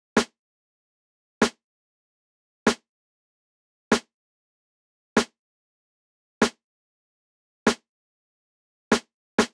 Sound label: snare; modern hip-hop